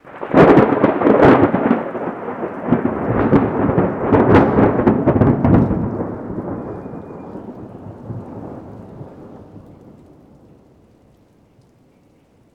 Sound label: Thunder